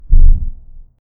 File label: monster heavy single foot, footstep